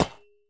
hit, copper statue

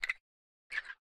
spin, copper golem, oxidized; Minecraft

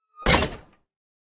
copper chest close

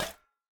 step, coppergolem; Minecraft

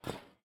step, copper golem, oxidized; Minecraft

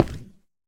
activate, shelf

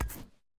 multi swap, shelf

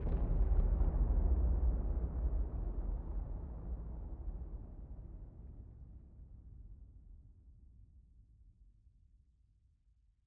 end flash, ambient weather; minecraft 1.21.10

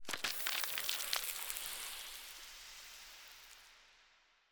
ambient, dried ghast

